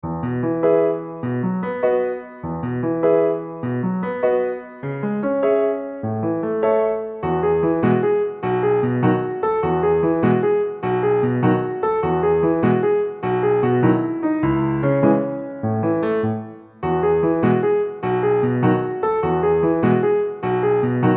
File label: solo, piano